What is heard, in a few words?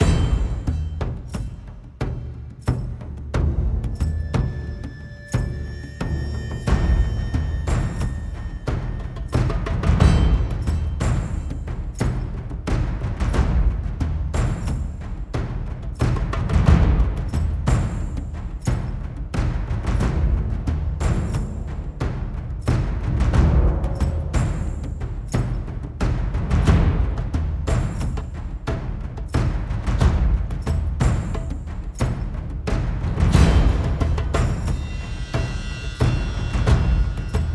Perc loop; God of War Ascension; PS3